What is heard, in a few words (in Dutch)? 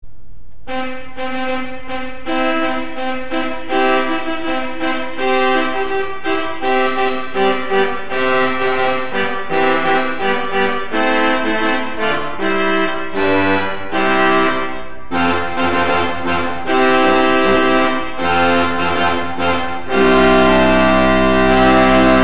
orgel, Trompet 8'; Geluidsfragmenten rugwerk